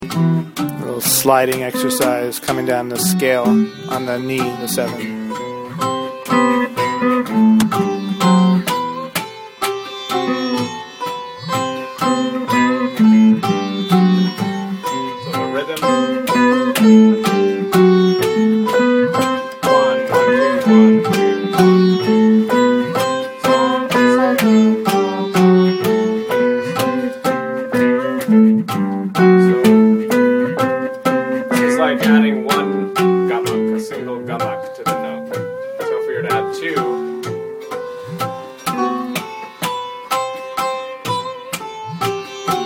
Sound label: ascending and descending with gamaks iman kalyan